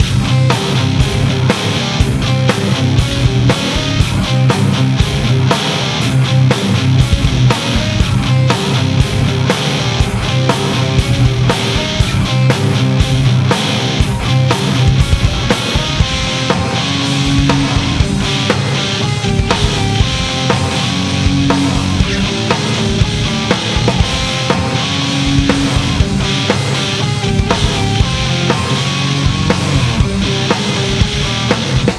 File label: Punk Loop